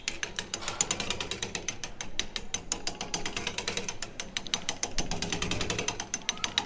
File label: Old Roller Coaster